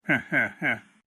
hehe; presidents; biden

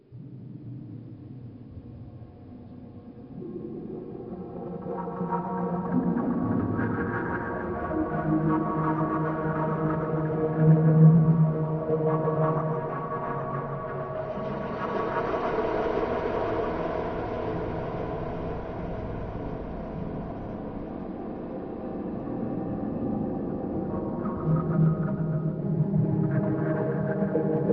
spooky ambience

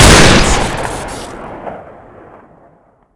rapidfire shoot crit

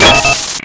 stun

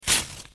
newspaper rip; mvm forever

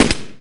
shoot low, weapons; the burping blaster

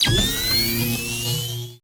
spin up; squareone

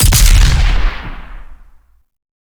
super shotgun fire

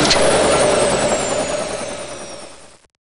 minigun wind down; tfc

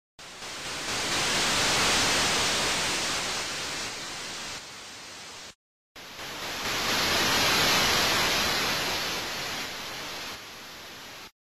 board ocean

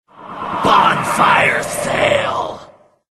powerup firesale; shadows

